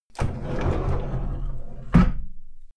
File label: sliding door